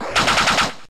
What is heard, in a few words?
Brave Bird; pokemon reborn e19 2022